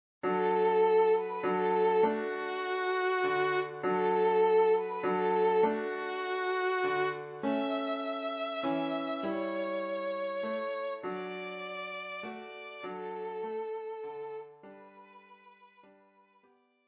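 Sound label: violin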